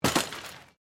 Bicycle Mountain Bike Crash Gravel, Bike being thrown around to simulate crash impacts; Bicycles; SoundEffects-Foundation1